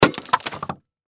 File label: Food Coconut Cut In Half Slice Crack Hard Fruit; Foley; Blastwave